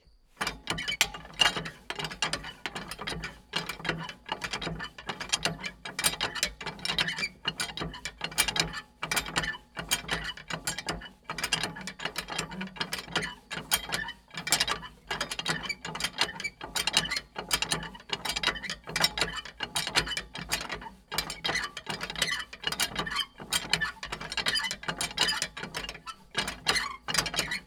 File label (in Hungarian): hosszu karos uresjarat; Varbóc; artézi kút